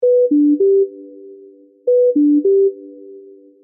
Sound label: Bluetooth pairing tone headphones connection; headphones and speakers